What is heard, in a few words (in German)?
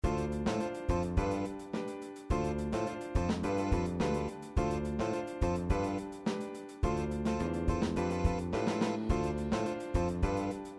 Bandpattern Funk; Popularmusik